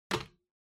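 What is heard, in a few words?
Ceiling Light (remote control Put)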